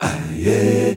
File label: Male Voice, African Voices